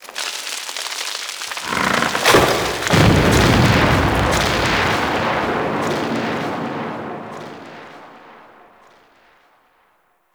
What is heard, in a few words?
Forest Distant Tree Fall; Warframe; Ambience; Grineer Forest; Titania Quest